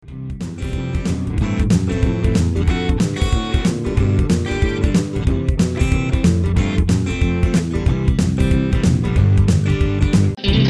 southern country rock

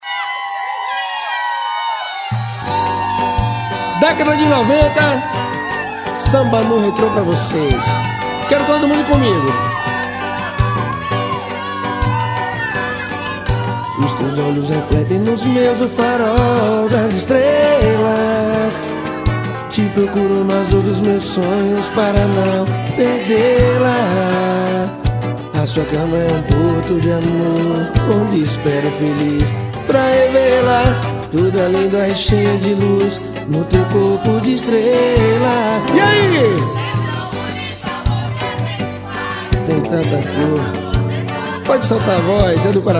samba nu retro

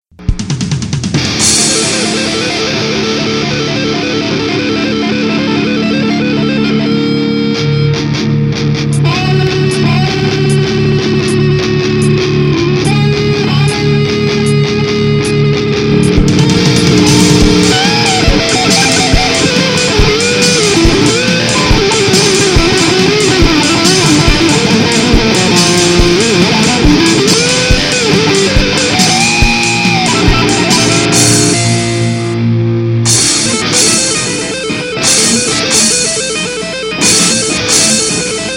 guitar

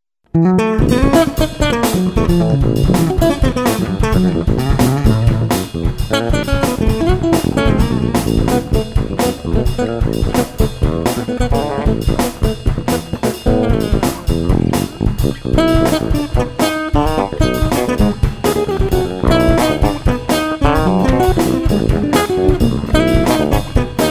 bass

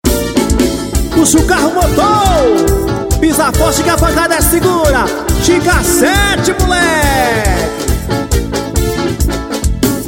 merengue

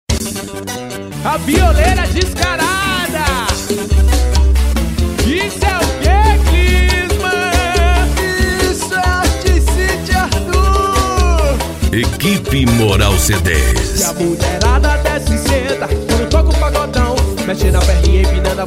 banda

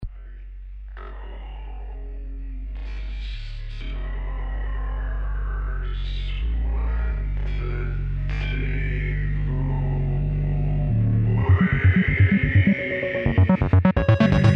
rain

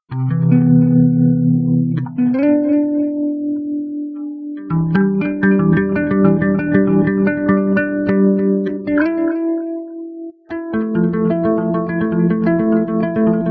echo trip

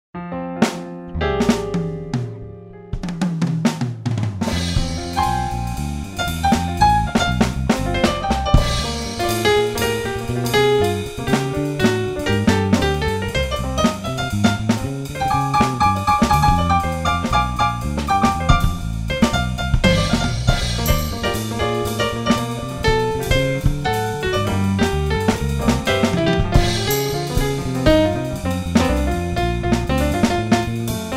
improvi jazz

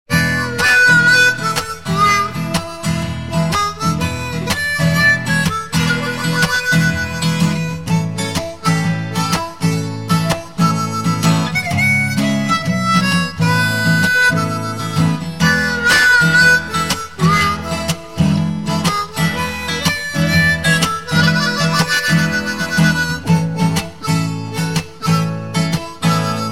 blues

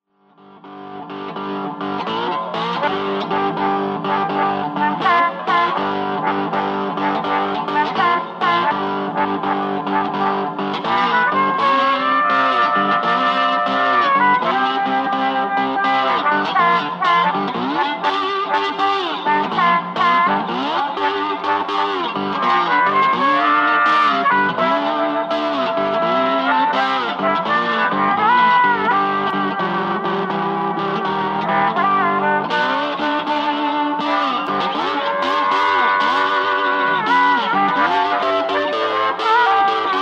slide guitar and harp improvisation

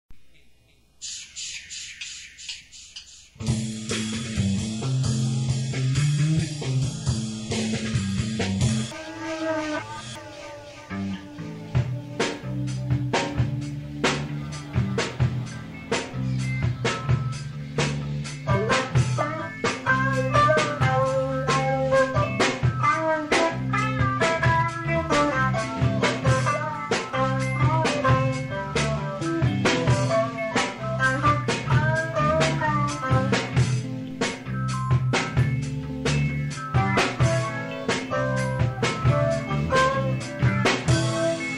instrumental; dharmanet

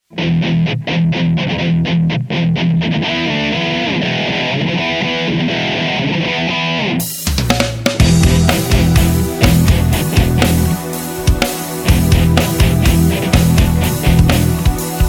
metal rock

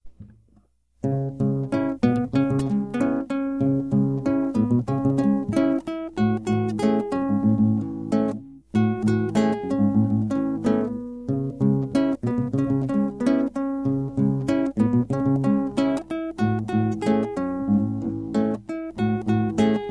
lullaby

